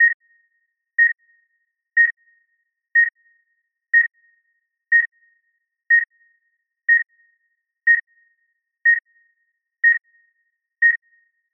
medical heart rate monitor fast

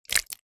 horror guts bloody splat squelch in plastic bowl; sound effects one

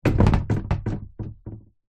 wood hollow panel wobble rattle on base; foley

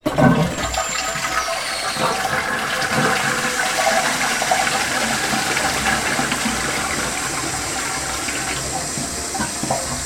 household toilet flush cistern; sound effects one

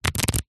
foley paper pages binder flick through fast; zapsplat

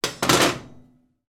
household metal oven hob pan support drop on hob

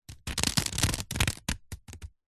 water drips sudden thin plastic wrap; nature

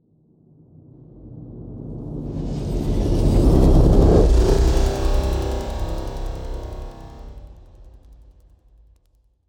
Cinematic Transition; soundbits

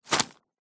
potato chips packet set down; 100g; zapsplat

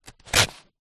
paper sheet thick slice cut knife

